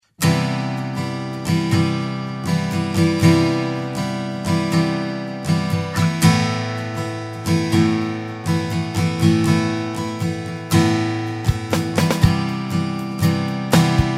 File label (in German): 6 achtel